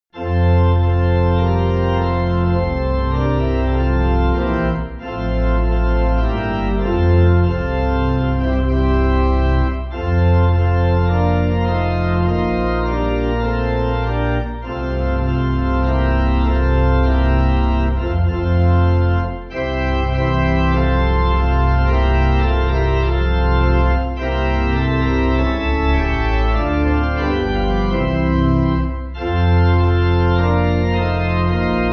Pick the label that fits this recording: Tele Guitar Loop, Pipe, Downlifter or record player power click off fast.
Pipe